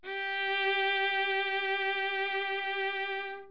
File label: violin G4